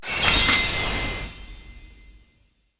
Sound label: Ice Magic Buff; Cielonos